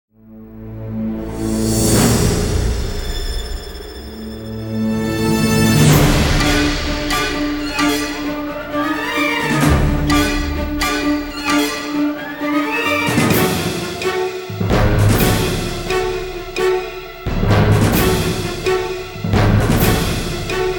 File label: Robot rampage